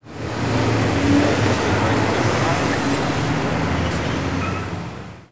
TRAFFIC, commercial

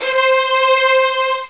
Strings C4; Flashback Demo

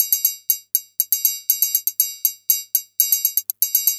storm tri loop; maudio; Elektron

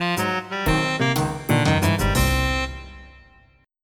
jazz

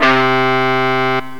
overdrive guitar

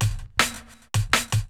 DRUMLOOP D+B 160, Drum+Bass Loop; eJay

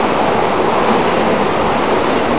wind

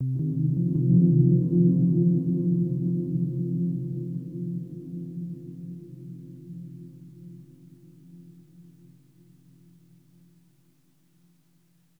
sphere ambient 160

